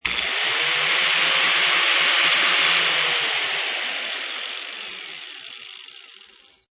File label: Disc Sander Short, Disc sander working in the air, start and ending noises, short; Machines